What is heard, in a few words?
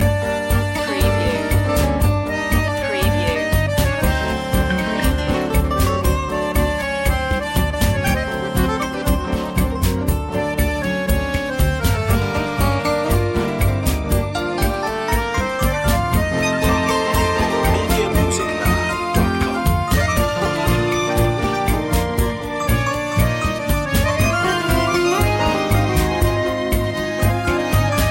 tango commerciale loop